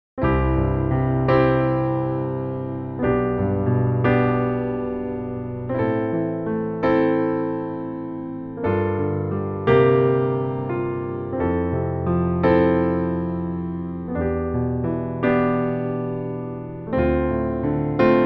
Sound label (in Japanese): 1-5-3演奏